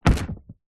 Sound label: foley container tub plastic set down concrete light grit; zapsplat